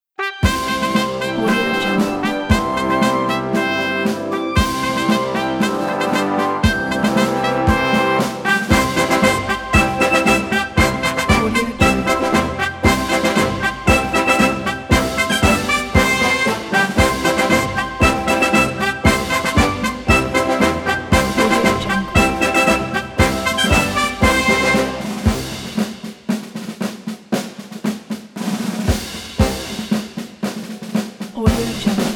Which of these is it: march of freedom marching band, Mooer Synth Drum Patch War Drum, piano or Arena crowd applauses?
march of freedom marching band